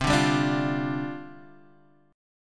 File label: C Suspended 2nd Suspended 4th, down, Guitar, Half Step Down; Csus2sus4